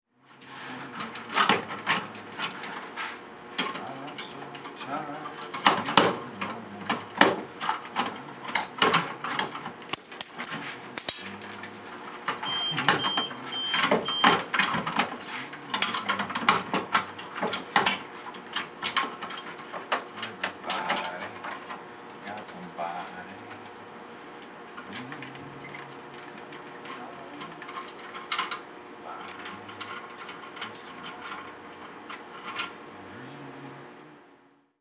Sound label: singsong